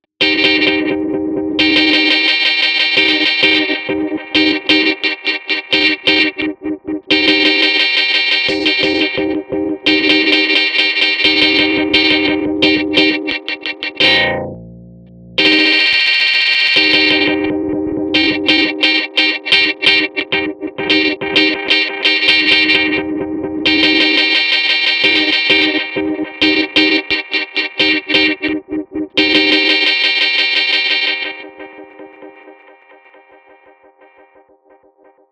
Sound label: Guitar Skank, Guitar Rolls